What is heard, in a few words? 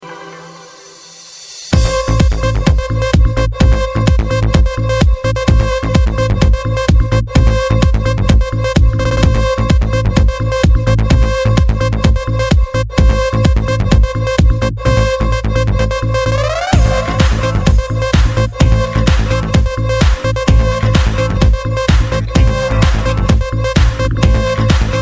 tom snare